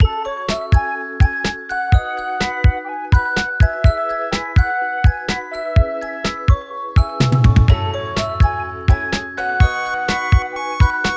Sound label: Instrumental